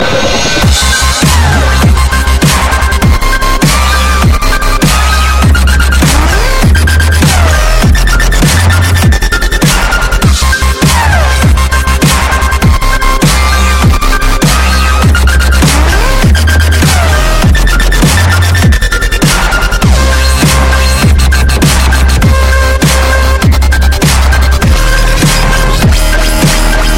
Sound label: Dubstep